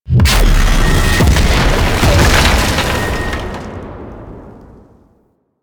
Fire Beam; Drifter Lotus Chase Intro

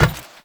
Step Metal, Footsteps; Warframe; Teshin

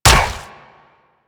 step default; Warframe; Infested; Zealoid Prelate

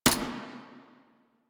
Grineer Assault Rifle Mech; Weapons; Warframe

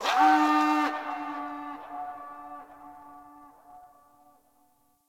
Distant Zone Three Creature; Ambience; One Offs; Warframe; Eidolon Plains

